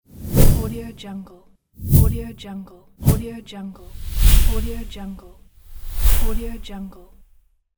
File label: swoosh transitions